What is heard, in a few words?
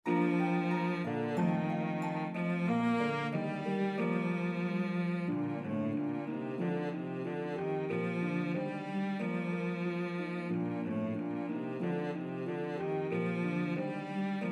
Harp Melody Instrument Cello